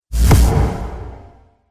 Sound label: Cinematic Strike Heavy Magic, Trailer Sound Effects